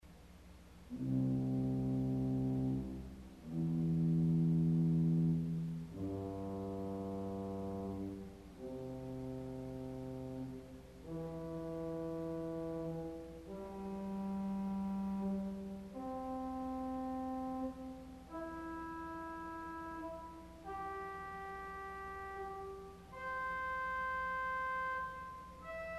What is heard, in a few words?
Viola Arpeg, organ; CulverAcademy